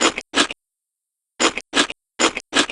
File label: munch; aj ema